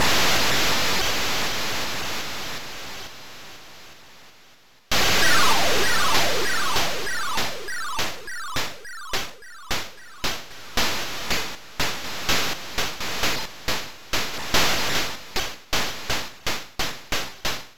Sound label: STEAM ENGINE